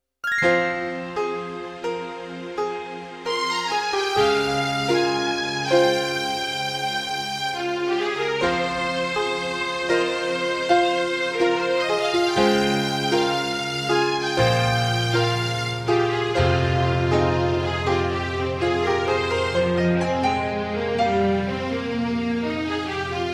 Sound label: Piano Piece